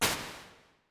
Medium Ambience, Lexicon 480L Reverb impulse response; Big Gee's